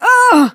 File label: hurt